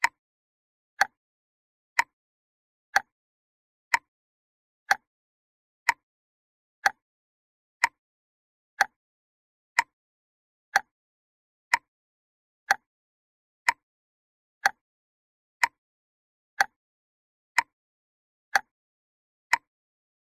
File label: household clock tick old mechanical